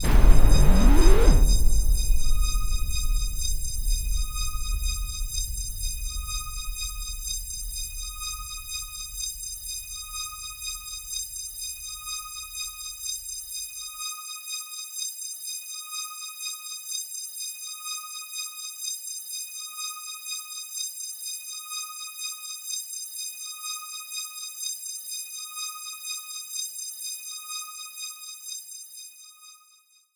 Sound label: BOOM Solomn Disturbance, Cinematic Metal Impacts